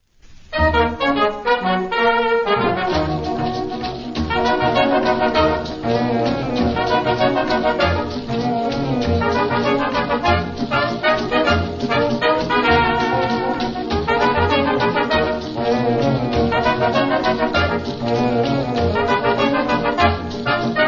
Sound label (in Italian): ORCHESTRA